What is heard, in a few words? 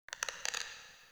Cork Creak; Neathuns; Puzzles; Bean Stalk